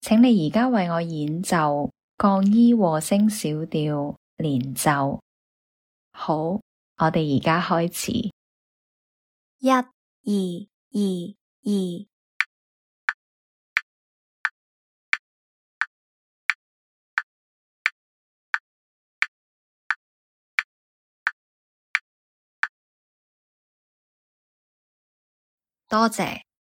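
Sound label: E flat minor harmonic scale legato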